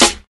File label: nice acoustic snare sample c sharp key